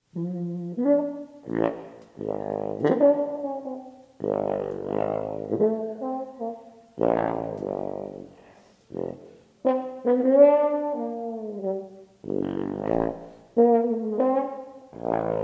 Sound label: tbn solo